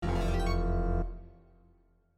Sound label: ensemble